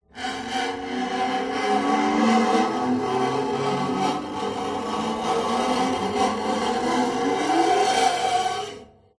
musical guitar steel string knife scrape strings weird abstract scraping tone